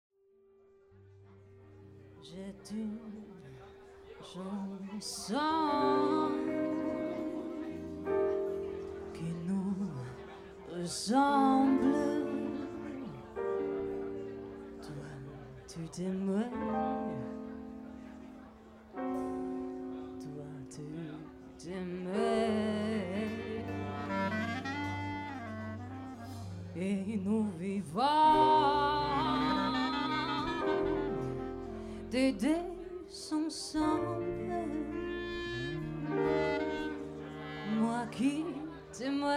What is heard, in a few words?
Swing Orchestra